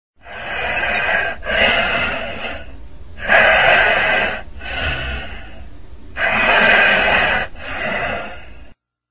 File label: Deep Breathing